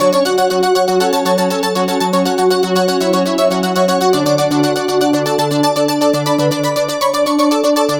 (arp); Sonic Foundry (Sony Creative Software); Ugly Remnants VOL-2

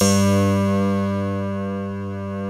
HRP RCDR&FLT

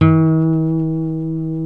F 3 nylon, Guitars

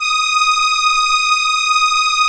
ARP STR ENSL, First Call Vintage Keyboards

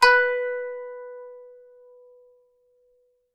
KANUN B4; SoundBytes; Early Patches; KAN SING BIG

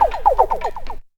More Plops; Jungle Warfare 1&2

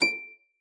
C#7, HARP; Xsample VOL-8; HARP P+MF

